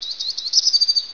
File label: Bird, ambience